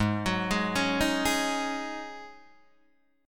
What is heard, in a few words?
G sharp 7th Suspended 4th G sharp, Guitar DADGAD